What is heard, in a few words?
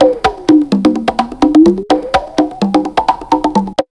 127 DRM, Fresh Disco House